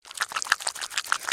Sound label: Pet Cat Eating; creatures pets